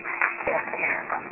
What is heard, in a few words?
camera